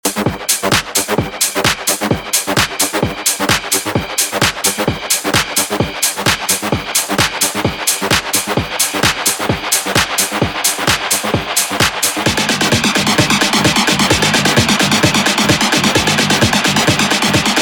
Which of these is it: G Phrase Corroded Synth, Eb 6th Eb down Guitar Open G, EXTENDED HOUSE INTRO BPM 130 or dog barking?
EXTENDED HOUSE INTRO BPM 130